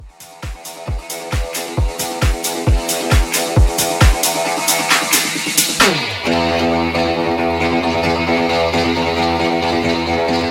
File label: EDM Boot